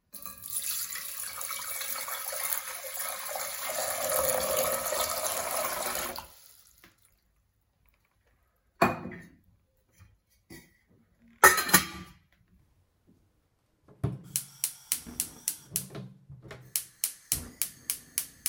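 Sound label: pouring water into a pot; kastruli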